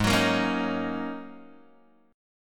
Ab 7th Suspended 2nd Suspended 4th, Guitar, Open G